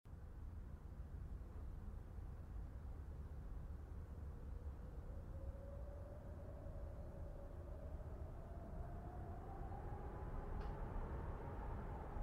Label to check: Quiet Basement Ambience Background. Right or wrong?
Right